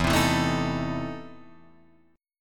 E Major 7th Suspended 4th Sharp 5th, Guitar, Drop D